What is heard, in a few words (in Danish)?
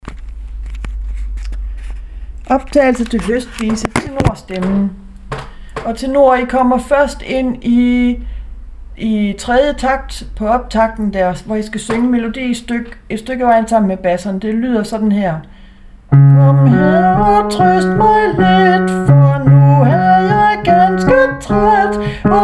høstvise tenor